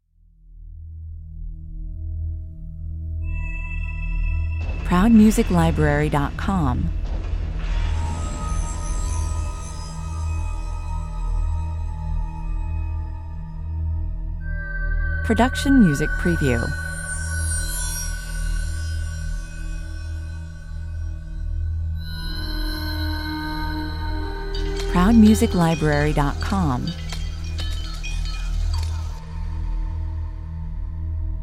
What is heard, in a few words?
metallic 128 bpm